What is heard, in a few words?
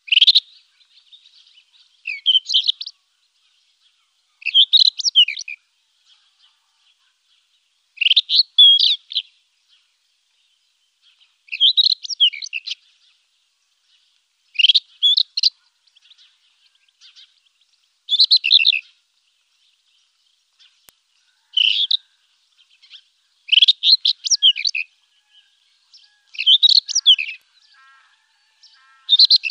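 solovey tugaynyy golos, nightingale voice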